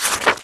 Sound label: page turn